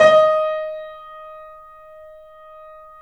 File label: D#, The Piano; InVision Interactive